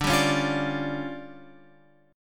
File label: D Minor Major 7th Double Flat 5th